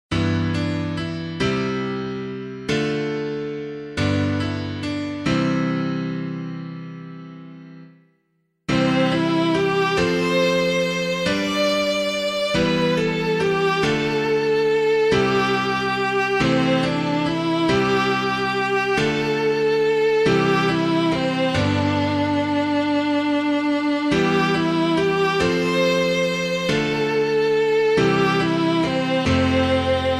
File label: piano, hymn; Christ Be beside Me; Quinn; BUNESSAN